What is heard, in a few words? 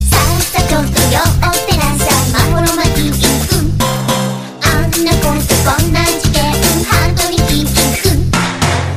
intro sound